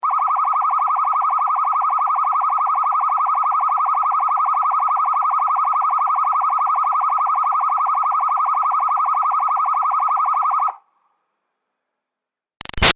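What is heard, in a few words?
Siren Police Blipper Steady Medium Far; Emergency Vehicles; Big Room Sound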